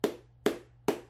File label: three low claps